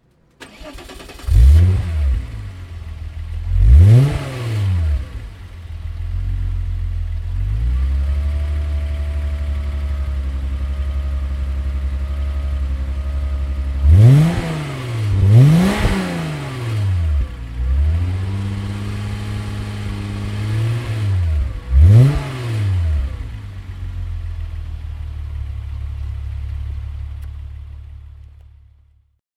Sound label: VW Corrado VR6; oldtimer